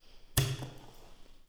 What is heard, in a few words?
vacuum tones